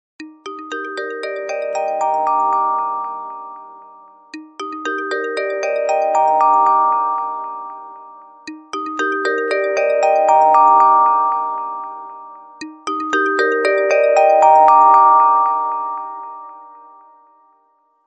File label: alarm 3 ringtone